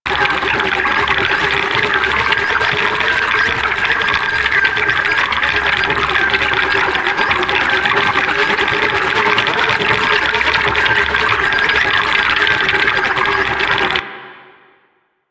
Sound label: Purify Machine Loop; Ambience; Warframe; Infested Eris; Encounters